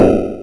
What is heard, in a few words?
K5 kit, Kawai; Rubber Chicken Software Sample Bank